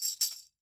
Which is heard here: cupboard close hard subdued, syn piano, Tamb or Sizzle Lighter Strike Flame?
Tamb